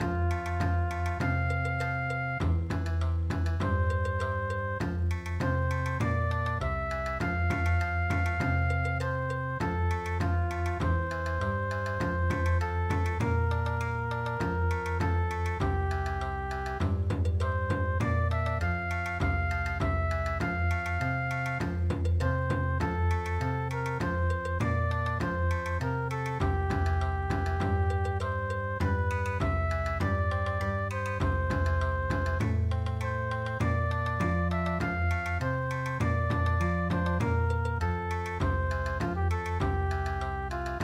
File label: Bon Odori, midi; Hark hark the lark